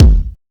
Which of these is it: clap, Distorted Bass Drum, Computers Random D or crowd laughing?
Distorted Bass Drum